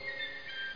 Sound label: Xmas Kiss Menu Command; CHRISTMASKISS